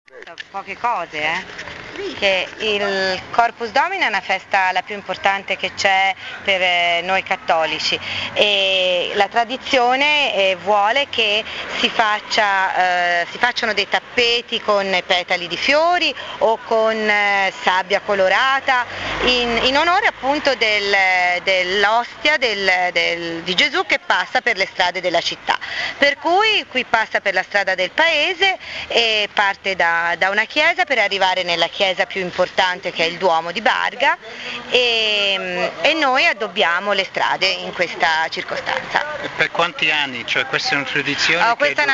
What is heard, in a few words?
Corpus Domini procession through Barga